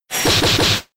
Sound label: Spin; Rapid Spin